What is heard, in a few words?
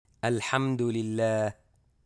SNEEZE